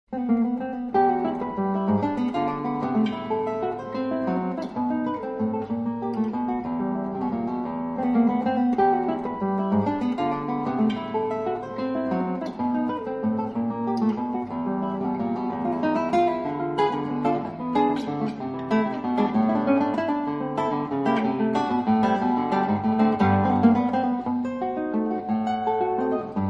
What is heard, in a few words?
Venezuelan Waltz, Classical Guitar